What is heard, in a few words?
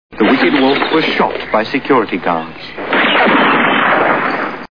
wolf shot